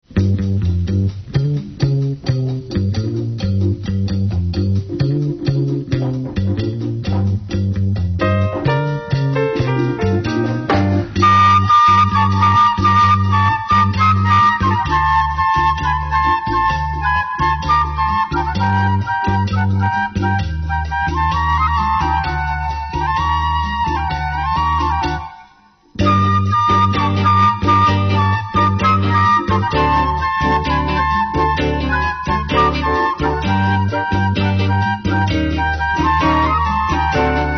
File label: flute; 東芝音楽工業